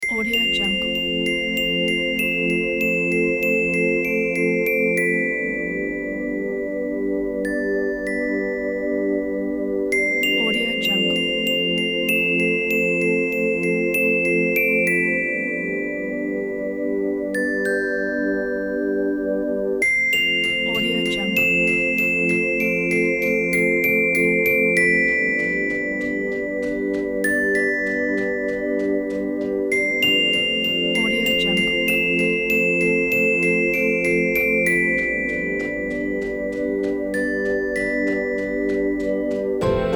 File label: piano magical dream